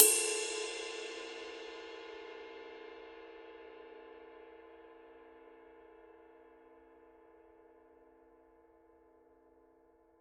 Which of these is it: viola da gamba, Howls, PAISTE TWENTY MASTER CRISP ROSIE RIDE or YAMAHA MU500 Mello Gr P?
PAISTE TWENTY MASTER CRISP ROSIE RIDE